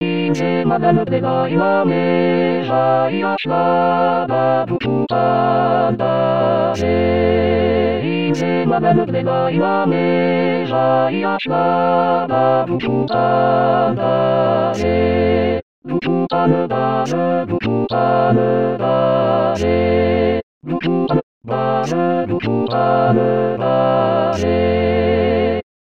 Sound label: tutti